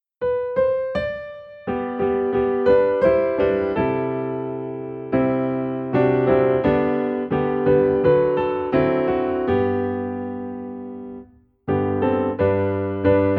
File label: Piano in G